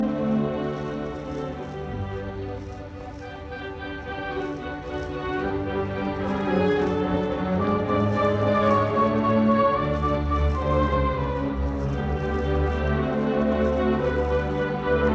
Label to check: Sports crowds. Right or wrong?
Wrong